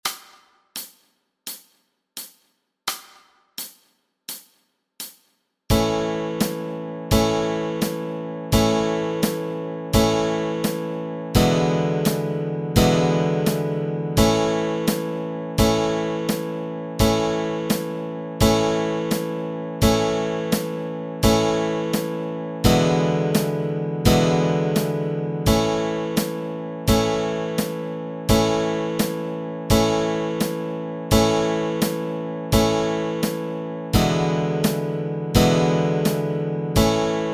London Bridge Chords Track, Guitar and Ukulele

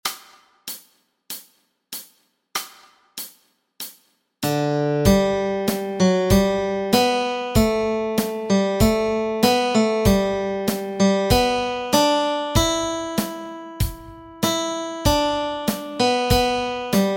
melody

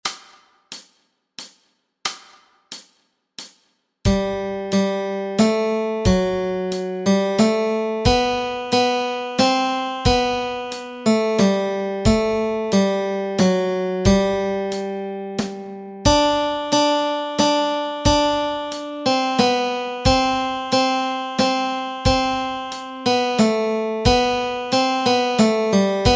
Melody Track

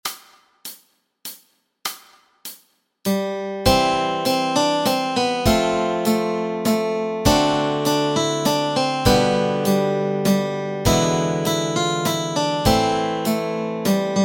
We Wish You A Merry Christmas melody and chords

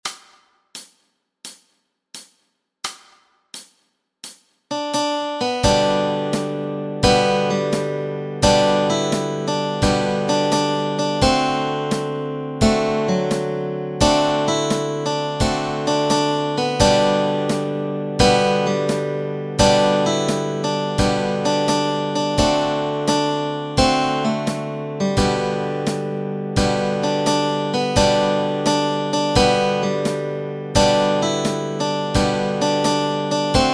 melody and chords

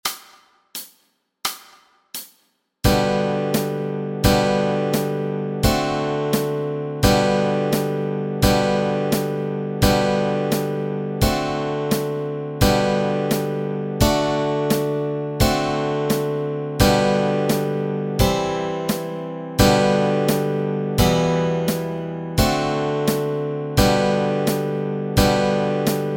chords, Easy Guitar

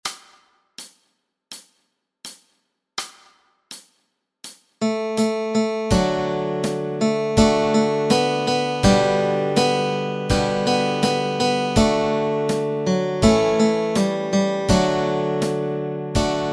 How Great Thou Art Chords melody and chords

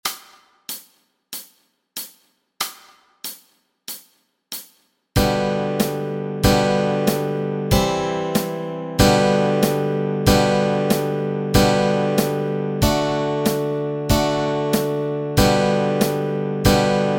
chords, Easy Guitar and Ukulele

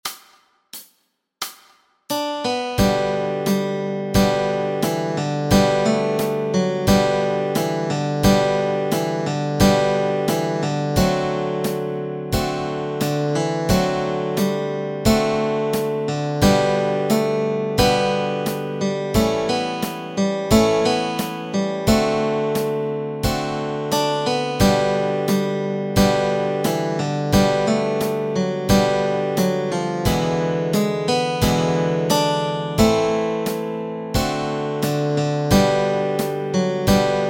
melody and chords

